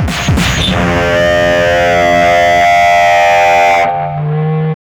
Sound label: WAR FX